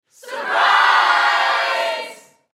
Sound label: Human Crowd Studio; Crowd Reactions Surprise Loud; Blastwave